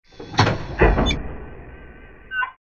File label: electric door